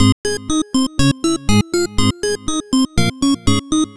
Ab 121, Synth Loops 121bpm; Acidized; Zero-G; Soundclash Nu Dance Electro

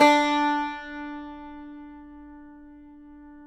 Cumbus D 4; Spectrasonics; Hans Zimmer Guitars VOL-2

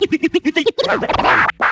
Vocal Scratches 140BPM

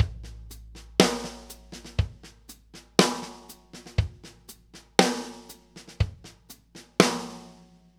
New York Loop, Drum Tools, Laidback

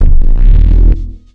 106 Swoop, One Shot Bass

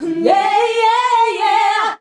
"HNYEAHI" E, FEMALES

